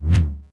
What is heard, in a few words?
pike swing; Star Wars Obi Wan; XBOX